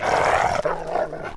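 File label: zombie dog dead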